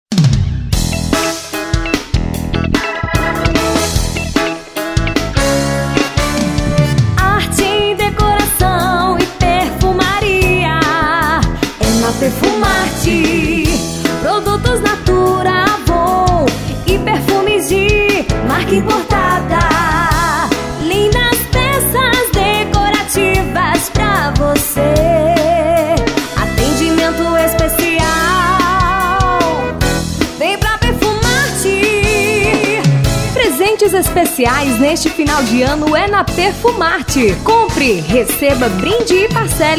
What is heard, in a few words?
JINGLE